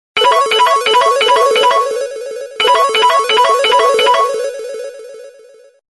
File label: Basic piped tone; Samsung; GT-C3300 Champ